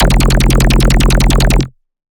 Dirty Bass F 150; One-Shots; Bass Hits (Dirty); Ghosthack - Color Bass Essentials

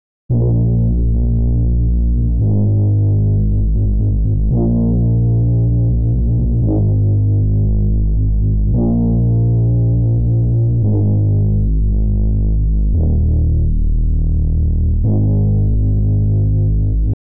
114 BPM D# Min Bass, loop stems; Cymatics; 2022 Melody Collection Platinum Expansion

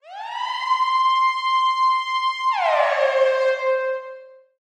Siren; Cymatics; Humble Hip Hop Sample Pack

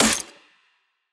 Snare, Dubstep Snares

